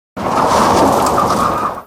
skid normal friction, vehicles; Source Engine; video game

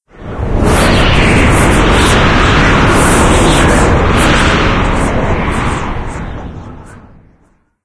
stereo gust; video games; Left 4 Dead 2; Source Engine; ambient; rainscapes

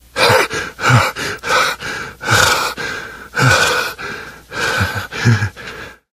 exertion major; voice; source engine; left 4 dead 2; namvet

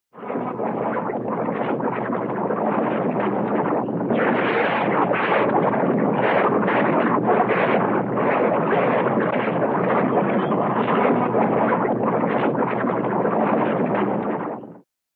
bubble, lava, boiling inferno; cartoon

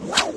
space restore down; windows 9x; sound schemes

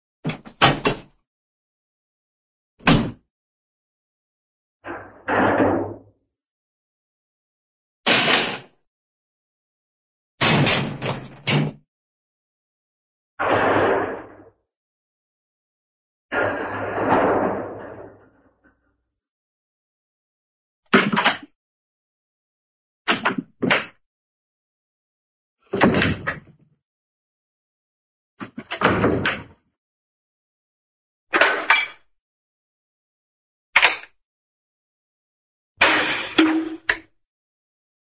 door, metal unlatch; cartoon

